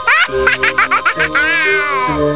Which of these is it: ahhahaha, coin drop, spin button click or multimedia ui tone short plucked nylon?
ahhahaha